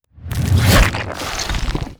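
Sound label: spider spawn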